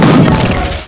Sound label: gun fire